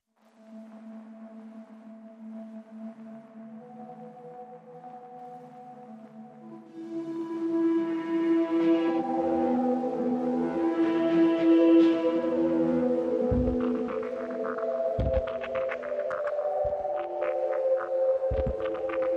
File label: Bleepy Carol Of The Bells